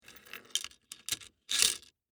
Door Lock Slide, Foley, Door, Lock, Slide, Chain, Lock; Door Closing; BlastWave